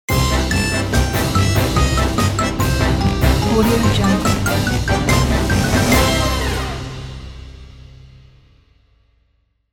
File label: funny circus logo